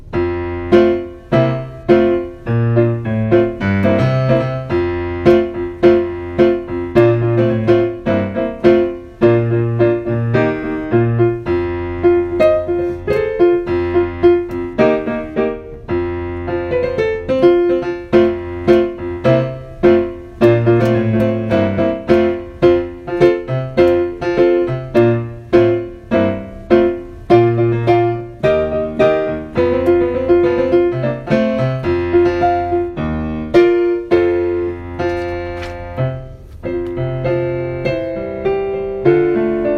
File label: accompaniment